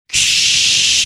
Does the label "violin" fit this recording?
No